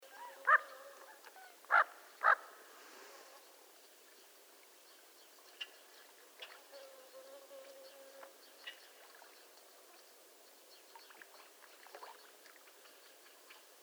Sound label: Female Bufflehead call